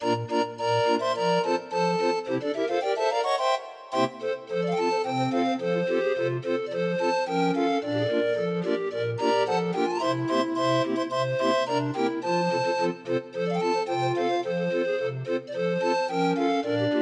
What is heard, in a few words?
Jingle Bells, 31 note, Grind Organ; Demo of 31 note MIDI file